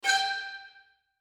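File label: woodwind string xylophone note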